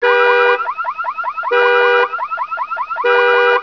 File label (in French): alarme voiture